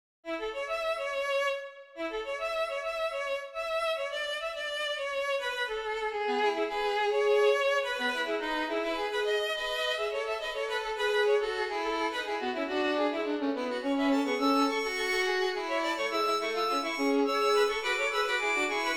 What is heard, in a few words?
Woodpecker Fugue